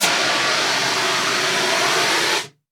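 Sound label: extinguish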